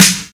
trebly snare one shot f sharp key, acoustic snare tuned to the F# note